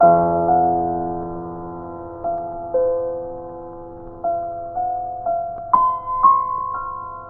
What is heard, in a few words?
piano, sad loop